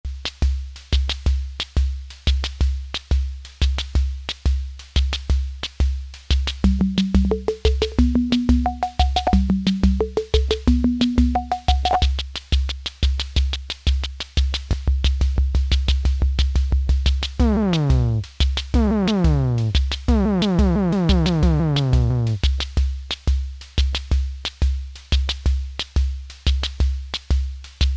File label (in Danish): tromme beat; trommemaskine